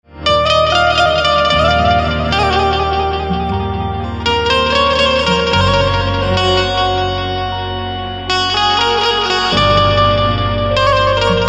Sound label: Banjo Instrumental Ringtone; kalank 2019